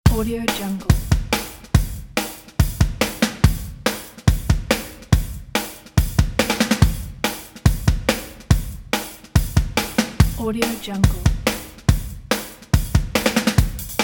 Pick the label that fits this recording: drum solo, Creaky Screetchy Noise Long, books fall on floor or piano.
drum solo